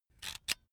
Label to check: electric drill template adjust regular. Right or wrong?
Right